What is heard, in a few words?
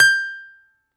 BALALAIKA, Russian Strings